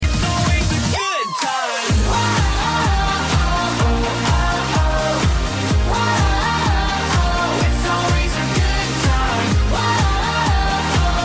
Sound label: walk up music